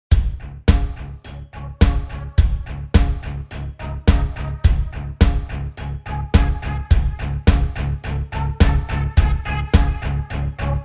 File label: Royalty Free Music